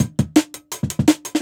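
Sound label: Jungle Breaks, 168 bpm; Original Jungle Breaks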